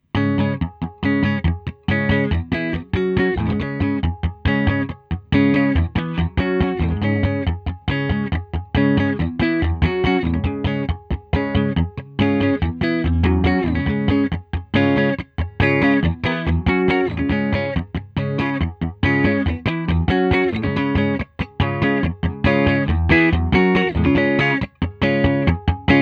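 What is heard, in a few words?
guitar mic seul; little labs; little labs ipb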